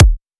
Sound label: Kick Minimal